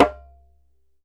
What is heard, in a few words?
DJEMBE